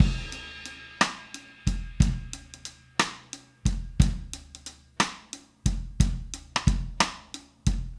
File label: Drums Crash, 70s Analog Funk; Sonic Foundry (Sony Creative Software); What it Is!